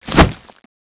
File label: zombie swing